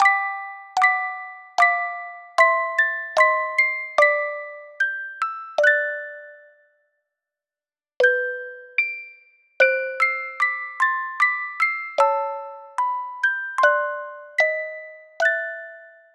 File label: Chorale, Music Box; animal crossing new leaf welcome aamiibo soundtrack